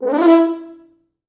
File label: 4 FrHorns FXMOD, E3; East West; Quantum Leap Horns Sax